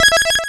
confirm save

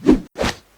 pose; holmes

